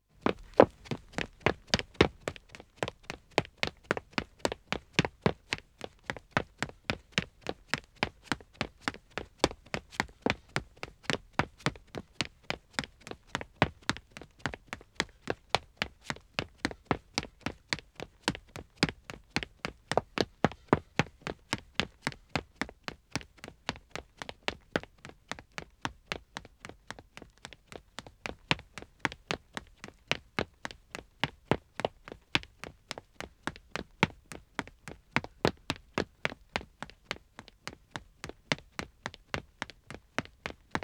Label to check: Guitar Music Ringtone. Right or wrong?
Wrong